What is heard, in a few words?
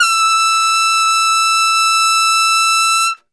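E 4 trumpet straight, brass; Best Service; Brass Super Section; TRP STRGHT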